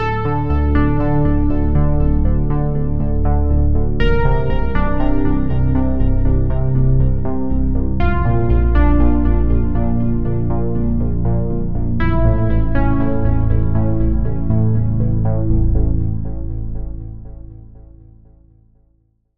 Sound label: Key